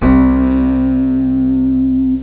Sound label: eerie bass; sonix; Rise Of The Dragon; chipmusic